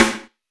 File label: SN, Snare; AKAI S6000 CD-ROM - Volume 4